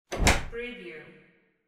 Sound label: door open